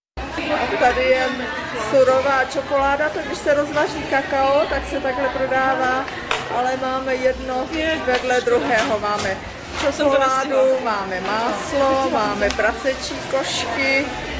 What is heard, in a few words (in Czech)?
Quito trh cokolada; Video Ekvador